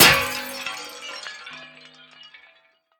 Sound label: shatter; tetrio